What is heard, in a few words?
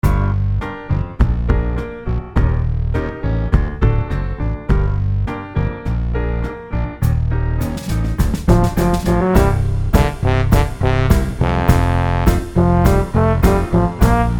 Trombone